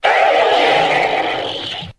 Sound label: ah stacked; ooh ahh